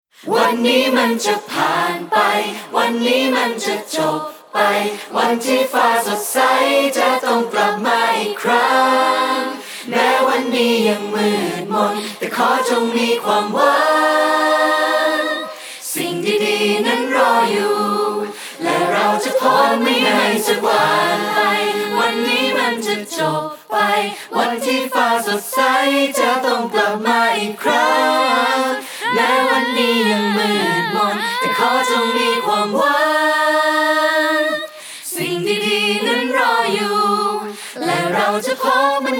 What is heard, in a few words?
Chorus only